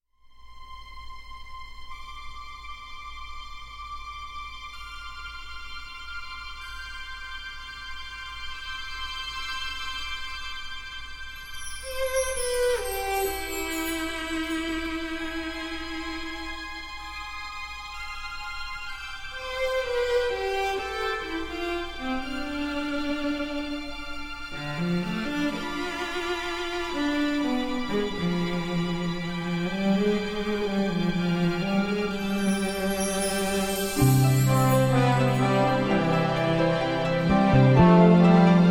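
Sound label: Overture, Strings and Brass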